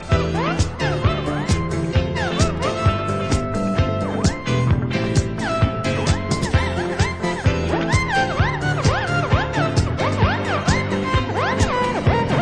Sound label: music funk